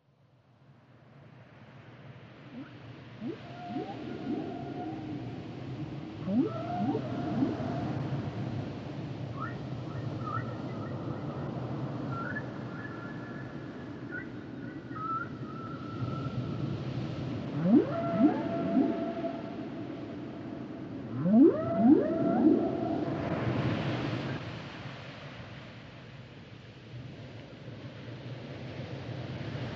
Nature Sounds Whales And Dolphins Whale Nature Sounds Songs